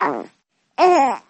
Baby Burp; Nursery

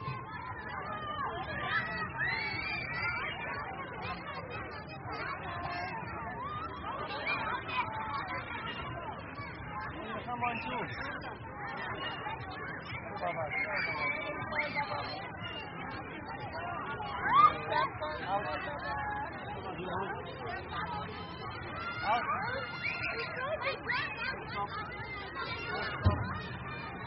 Nursery Kids Playing Outdoors

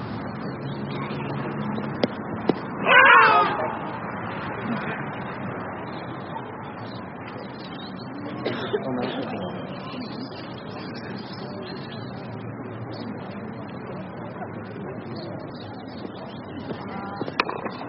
Park Cricket

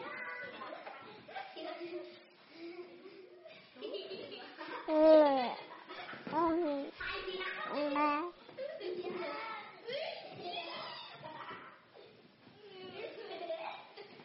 Nursery Baby Room

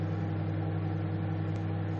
Cooking

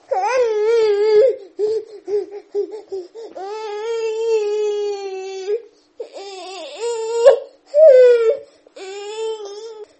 Baby Grizzle

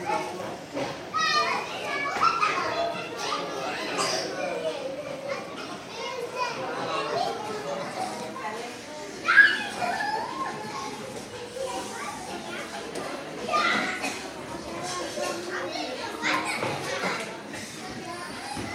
Amb Mall Toyshop

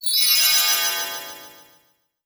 fairy teleport; mixkit

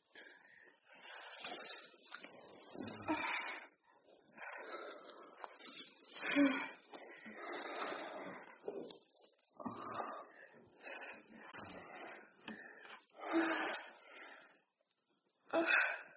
Amb Brothel Fucking

